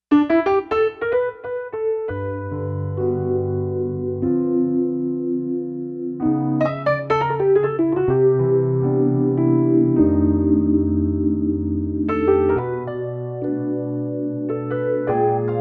Phased Roads and Piano, layering digital keyboard sounds